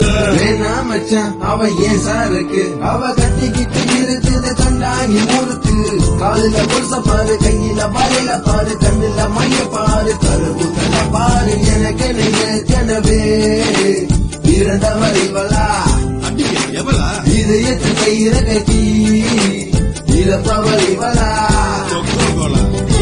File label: malaysia song